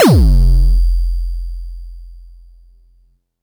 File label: Kicks, Elektron MachineDrum SPS1 MKII